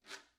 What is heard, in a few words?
guiro short; Idiophones; Struck Idiophones